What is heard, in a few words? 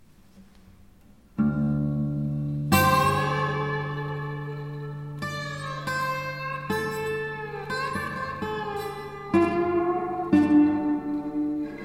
bottleneck blues